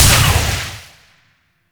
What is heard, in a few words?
Shot Small; Weapons; Warframe; Duviri; Incarnon Adapters